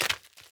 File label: Enemy Skeleton Break